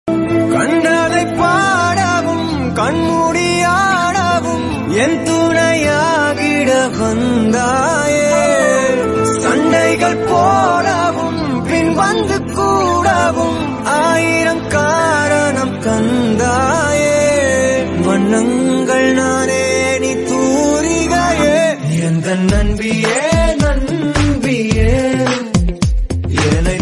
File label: Tamil Song Status Ringtone